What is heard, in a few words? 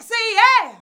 VOX, Singin